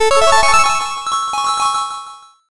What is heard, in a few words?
jackpot